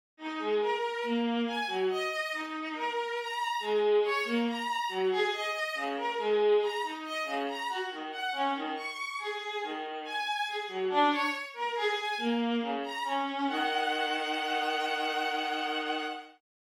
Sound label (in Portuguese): Melodia Trio Penta